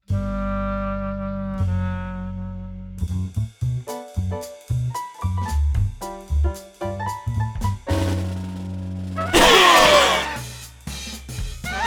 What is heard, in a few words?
Jazz; Mucinex; Commercials